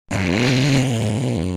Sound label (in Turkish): motor sesi eko